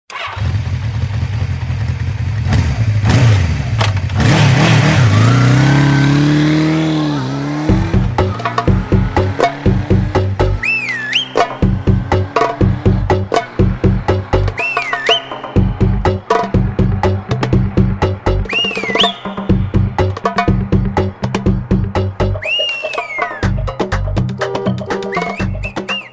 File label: Oriental One & Half, oriental belly dance; oriental belly dance vol 8